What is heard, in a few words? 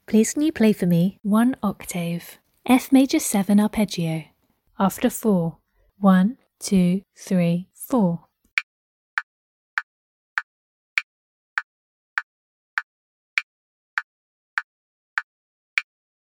f maj 7 arp